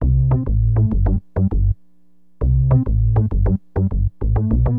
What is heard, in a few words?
SYN BAS, Acid Jazz Funk